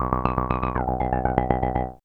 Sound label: POLYMOD; AMG - Vince Clarke Lucky Bastard